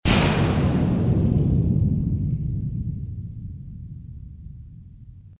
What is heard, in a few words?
kaboom